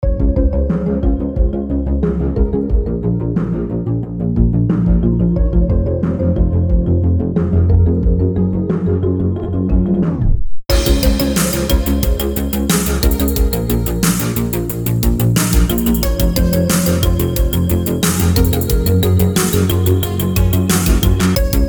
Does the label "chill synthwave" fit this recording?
Yes